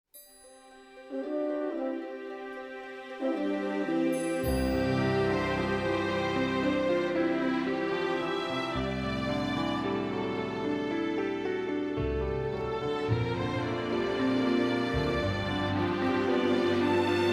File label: Orchestral